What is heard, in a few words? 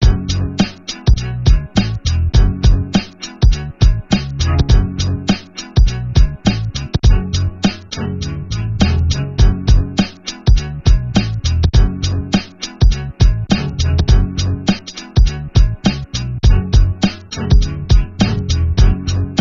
beat